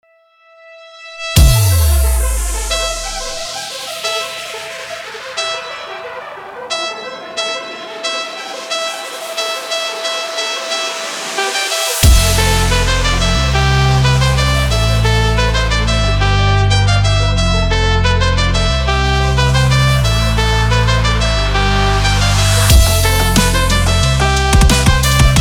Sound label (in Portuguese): INTRO TROMPETE DO ROCK DOIDO